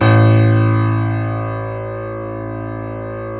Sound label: JAZZ A0, Keyboards Acoustic; USB Soundscan vol.17